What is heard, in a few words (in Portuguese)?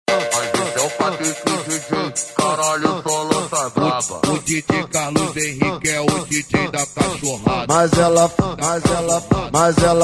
eletrofunk; cd caminhao street sound vol 02